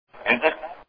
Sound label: radio sweep